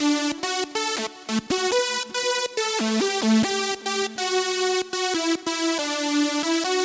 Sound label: Mega Dance Synth